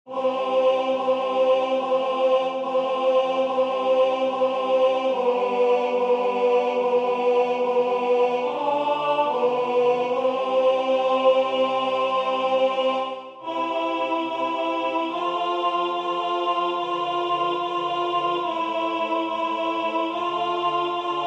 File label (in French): Priere des freres moraves alto